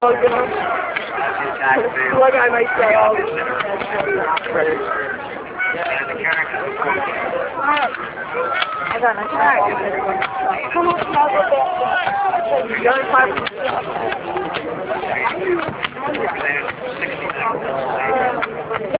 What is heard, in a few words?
drum hit